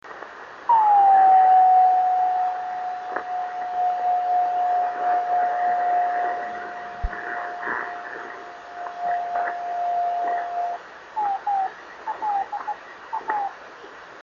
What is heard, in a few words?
26540KHz, drift net beacons